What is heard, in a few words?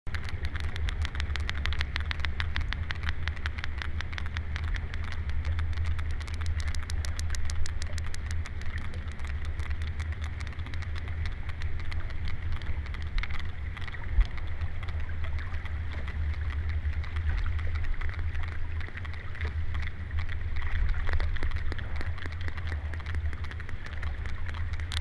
The Sound of Sperm Whales